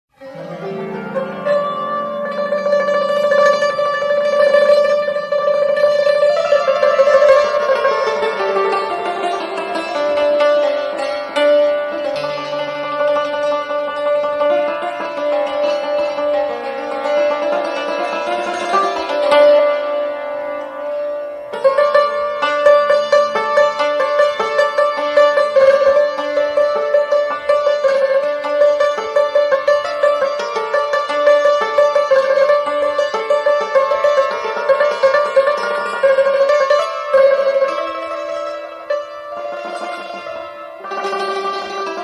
santor

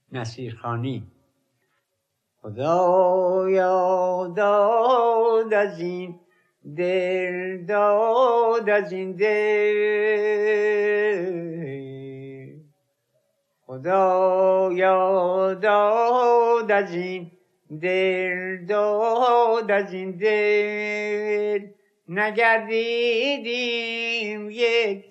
nasir khani, mahour

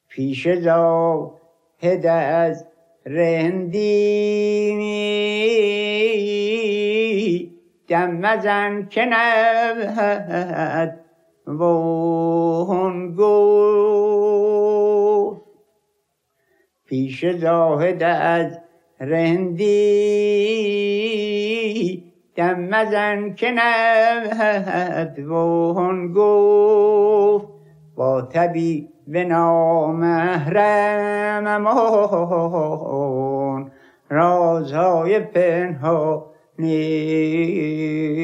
takhte taghdis, nava

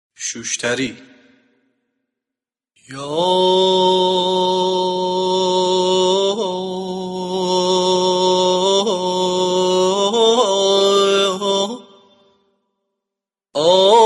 shoshtari

